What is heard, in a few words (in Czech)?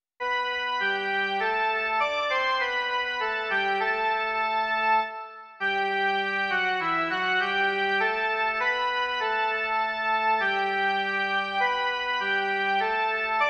jen melodie; Přišli jsme, ó Ježíši